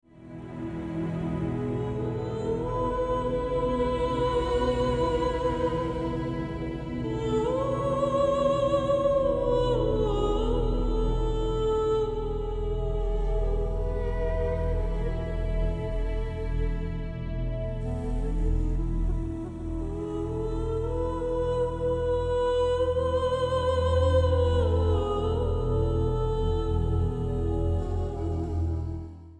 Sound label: Ethnic Film Music